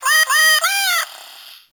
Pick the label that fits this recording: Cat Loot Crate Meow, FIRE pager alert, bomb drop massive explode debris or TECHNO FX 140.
Cat Loot Crate Meow